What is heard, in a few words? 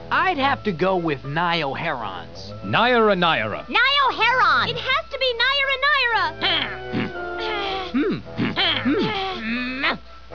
grunt; The gang argues about which restaurant to go to